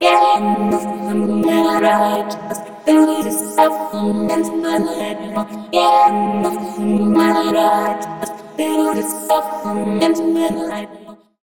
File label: Orange Vocoder Mixed Synth Pitch Q Filter LFO Freeze FX; Zynaptiq